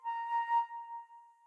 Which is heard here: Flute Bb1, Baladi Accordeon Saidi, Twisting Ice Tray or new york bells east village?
Flute Bb1